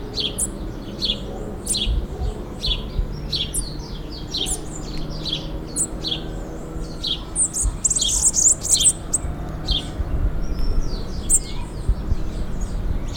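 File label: Coereba flaveola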